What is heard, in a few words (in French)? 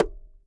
Bongo aigu slap; Tam Tam Drum Kits